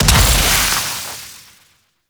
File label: Invisibility Proj Explosion; Warframe; The New War; Drifter; Abilities